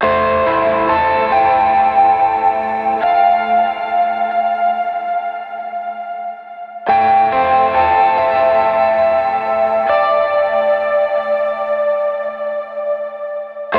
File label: Lead Guitar - Am7 70Bpm, Lo-Fi; HipHop; Construction Kit; STEMS; Ghosthack; Ghosthack Advent Calendar - Day 4 - Lo-Fi Kit